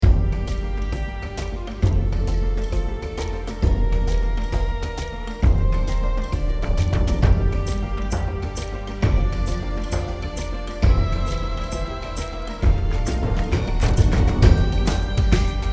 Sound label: into the wild perc, medieval europe